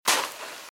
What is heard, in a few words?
Footsteps - Sand - Dry - Walk - Left; RadwinIsland